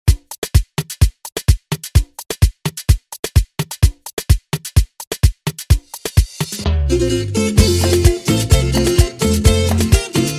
remix intro 128 BPM

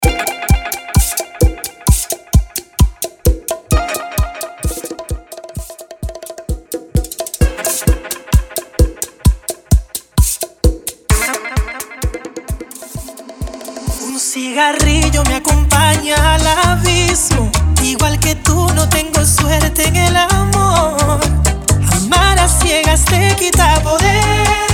Intro Outro Break 130BPM

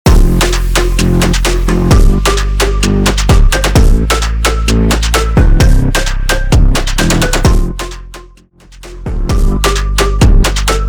Funk Brasilero Intro Outro 130 BPM; Prodeejay Remix

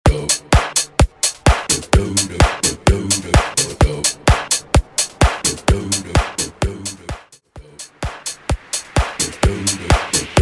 House Mashup 128 BPM

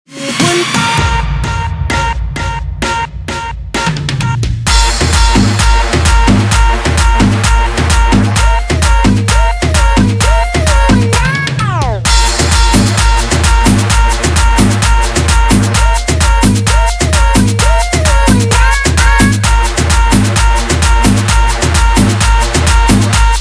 guaracha remix 130 bpm; Reggaeton dj remixes

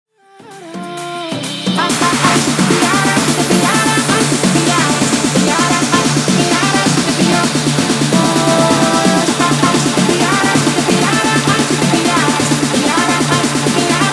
tribal remix 130 bpm

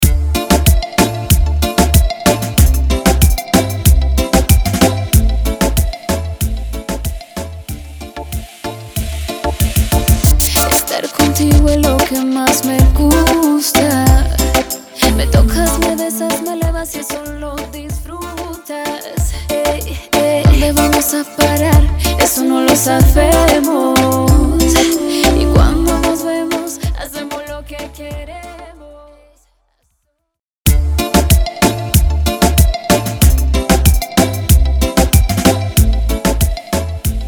intro outro break 94 BPM

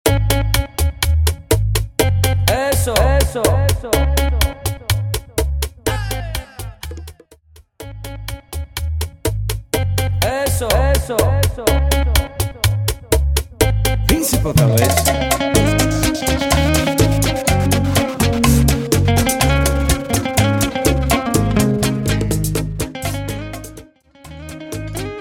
Bachata 124 bpm

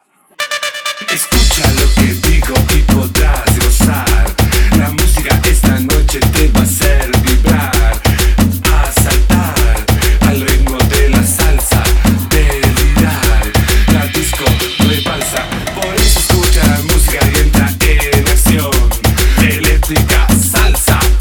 salsa intro outro 131 bpm

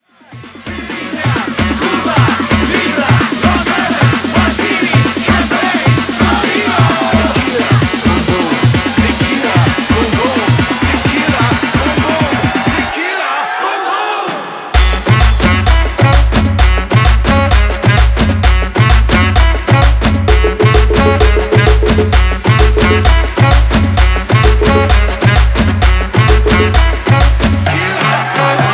guaracha remix 130 bpm

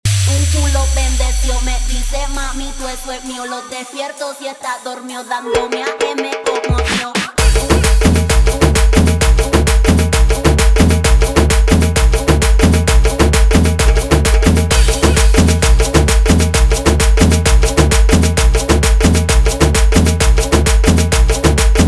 guaracha remix 131 bpm

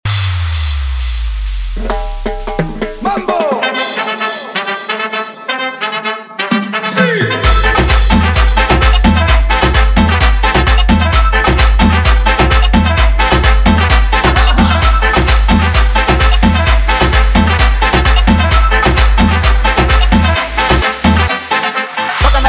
intro outro 130 bpm

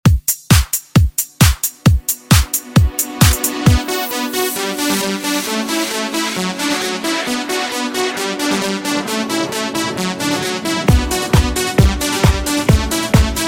133 bpm

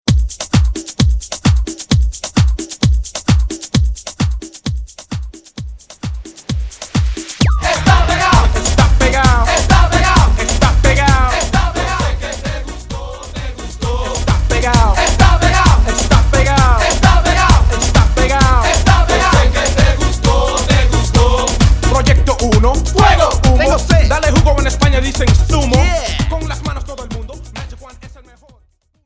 Intro Chorus 131BPM

